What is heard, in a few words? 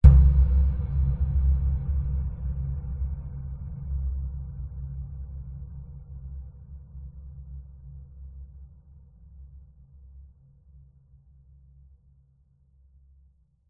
sound design cinematic hit dark reverberant shock terror suspense